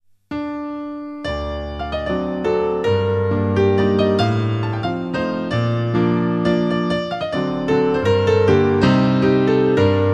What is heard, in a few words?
Chopin Mazurka; opus posth 67 Nr2